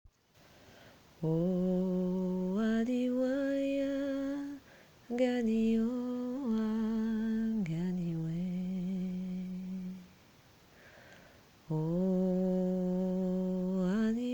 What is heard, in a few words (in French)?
chant sacralite nature pur sauvage